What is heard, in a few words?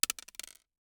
DICE on Hard Wood, Throw and Roll, Mini, 1 One Die; Samples; ArticulatedSounds; DICE Sfx Party (Extended)